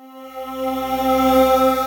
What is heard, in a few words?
Desert Dream, Ambient & Evolving; Wavetable; Ableton; Factory Packs; Synth Essentials